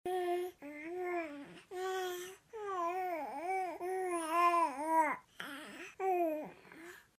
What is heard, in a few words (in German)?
3 Monate, Tonbeispiel, Sprache